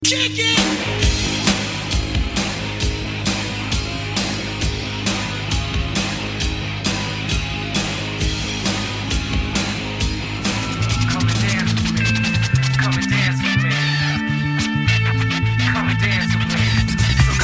Guitar Remix

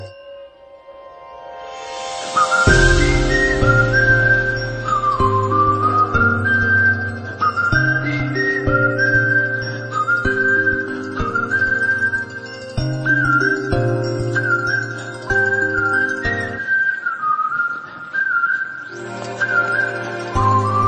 Music Tone

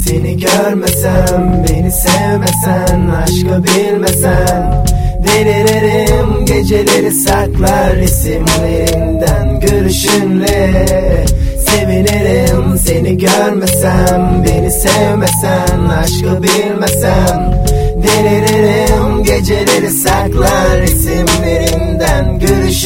Turkish Rap

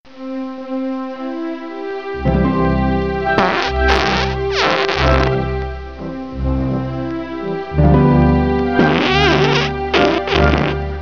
Fart Waltz

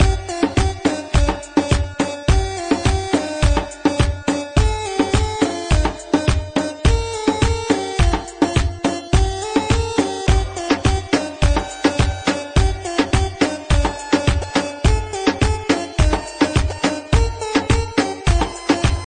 Arabic Music

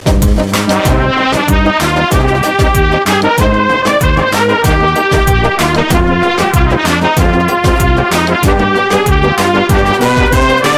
Brass

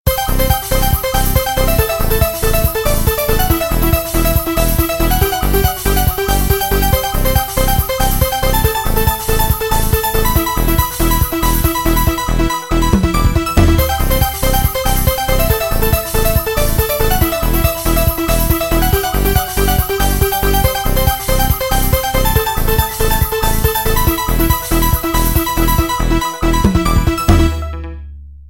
Trance; Nokia

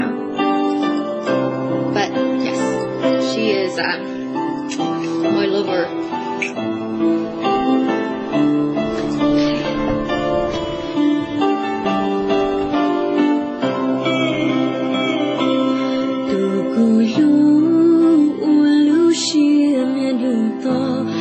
Hmong Love Song

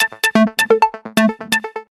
Message; Cyan

